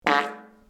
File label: tiny little teeni fart